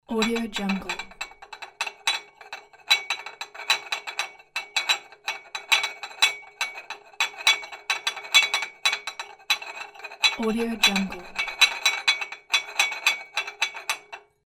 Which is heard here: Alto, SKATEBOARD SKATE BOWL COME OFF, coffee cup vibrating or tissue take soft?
coffee cup vibrating